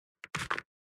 UI dumping sound

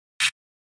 CAMERA ZOOM

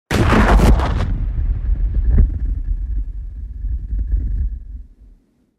Explosion

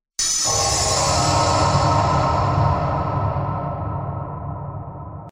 Horror

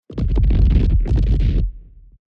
Rolling stone

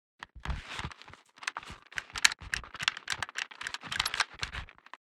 Ranger movement

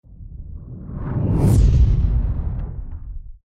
Fire element spell

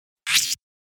ROBOT SMALL

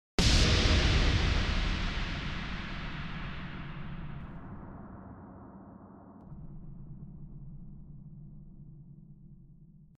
UI comform sound

